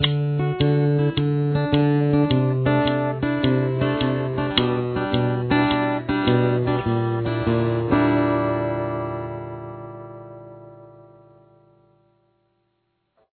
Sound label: outro